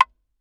graniteblock4 ff stereo, Granite Blocks, Hand Percussion